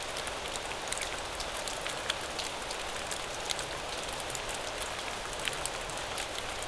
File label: rain; Mafie 1